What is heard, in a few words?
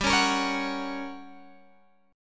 G Minor Major 7th Flat 5th G, Guitar Open G; chordshape; down